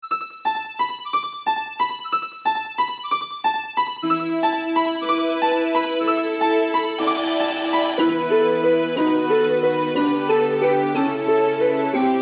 Remix; Snowbelle City

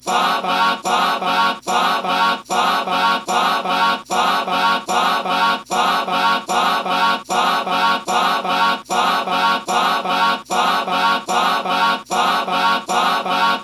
flute; classical

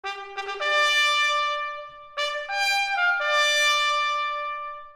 Trumpet

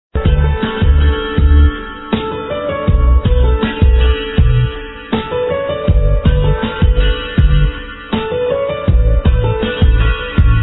flute, ringtone; blues

